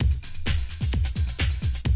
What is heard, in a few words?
130, Classic DrumLoops